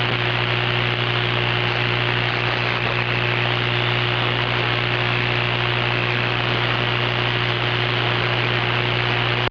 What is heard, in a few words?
QRN; CQWWSSB2006